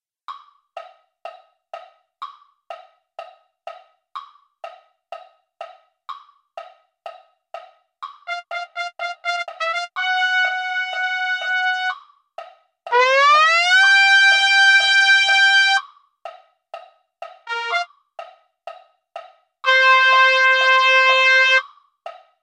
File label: trumpet; concert corps